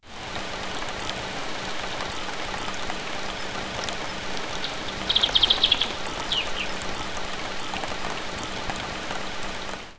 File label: rain drops on a windowsof; creek